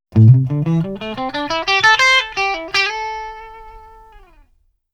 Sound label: Back Guitar; K-ON